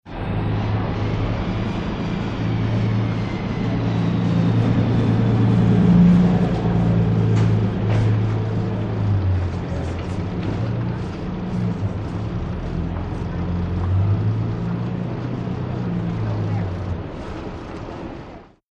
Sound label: Baggage Car Arrivin, airport